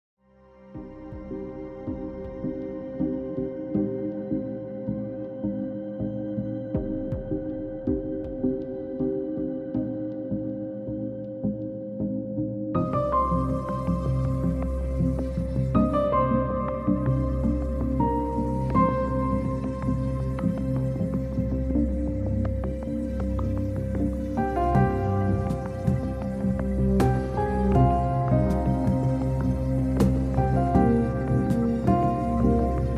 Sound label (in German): heilmusik 528 hz